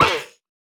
犰狳受伤声

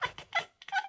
猫乞食声

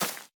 洞穴藤蔓破坏声; ambient

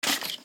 脚步声; 流浪者

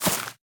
藤蔓攀爬声

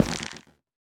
菌柄踩踏声

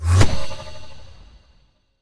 幻术师镜像移动声

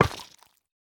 幽匿催发体放置声

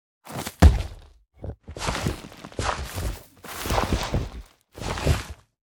嗅探兽长挖掘声

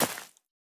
杜鹃破坏声

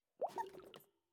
美西螈待机声

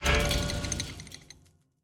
试炼刷怪笼检测玩家声

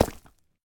深板岩踩踏声

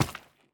珊瑚踩踏声

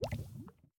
滴水石锥熔岩滴入炼药锅声

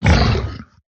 僵尸疣猪兽受伤声

